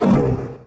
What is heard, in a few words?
hurt; FlyForInsanity